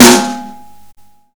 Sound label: Snare Warm, Drum and Bass